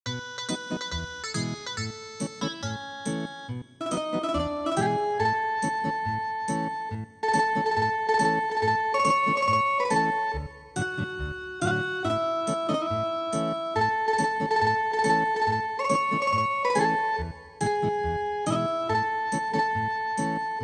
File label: Ethnic Guitar, traditional